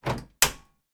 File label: household grill open; george foreman style